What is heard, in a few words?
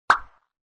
PACHIN; UISound